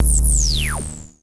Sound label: Klone Dual-Percussion-Synthesiser; EFX